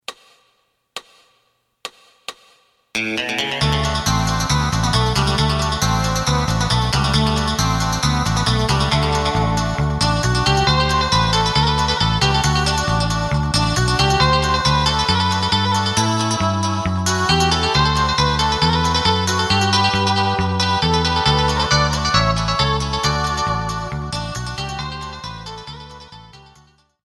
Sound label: drum